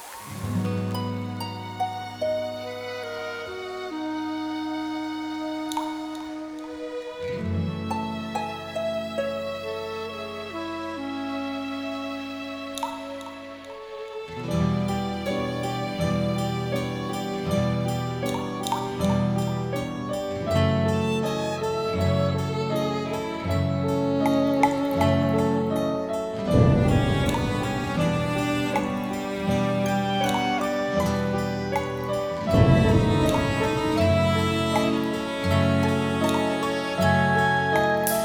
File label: Instrumental